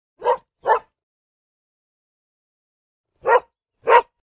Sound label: Dog Dbl Barks X2, dog barks